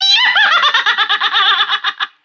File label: laugh big